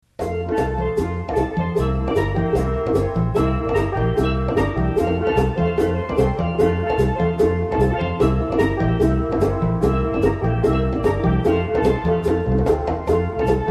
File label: Trinidad, Pan Band